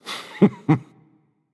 Heh Heh; smite; anubis

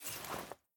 mvt armor; smite; gilgamesh

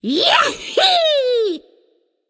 "yay"; babayaga

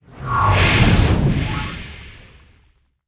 whirlpool activate; smite; poseidon

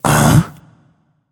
notice; smite; voicepack; guanyu

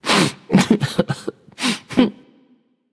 boohoo; smite; thoth

